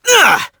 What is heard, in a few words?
heavy pain; sonic; vc roger